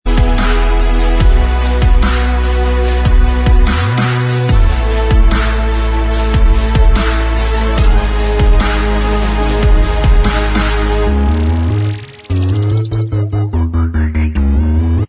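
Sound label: Instrumental Track, backing tracks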